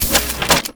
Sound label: Gun Reload Weapon, Weapons Foley; SFX Kit